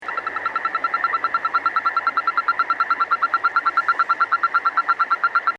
bcanorus, frogs

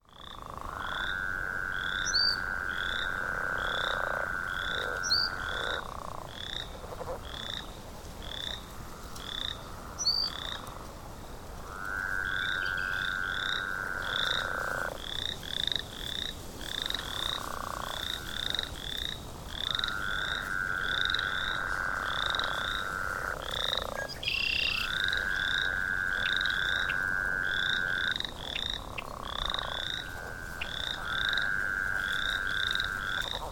frogs canada; This is a 90 second recording of several species calling at a marsh in Manitoba, Canada shown to the right on a May afternoon; with a Northern Leopard Frog calling in the background

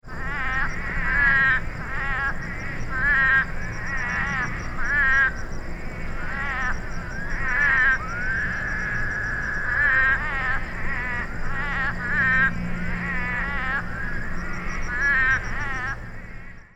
scouchii chorus, frogs

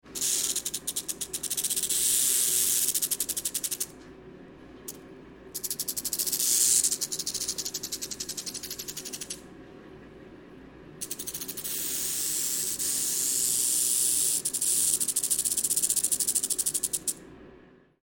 Crotalus oreganus helleri; ASDM

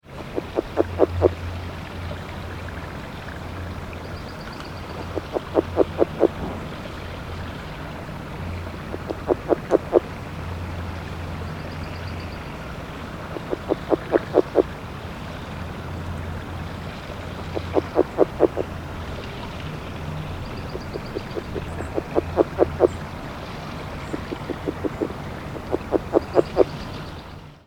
rdraytonii long

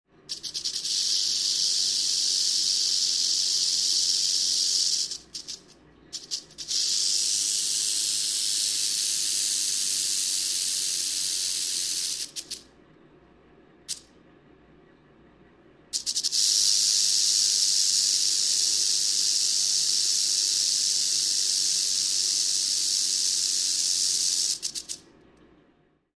Crotalus tigris; ASDM

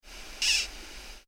gecko chirp

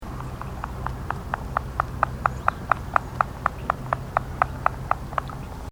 rpretiosa, frogs

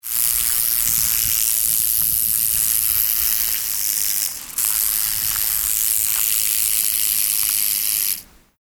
sidewinder

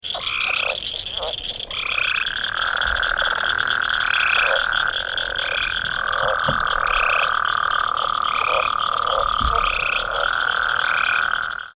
rsphenocephala

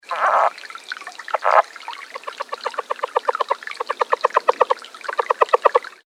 ryavapaiensis short, frogs

